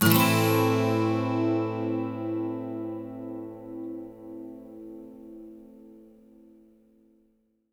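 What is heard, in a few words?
12 string minor, Acoustic Guitar Loops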